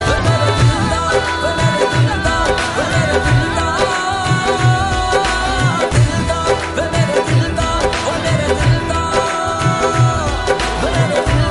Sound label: Instrumental BGM